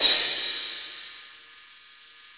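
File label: cymbal crash; Best Service Gigapack 2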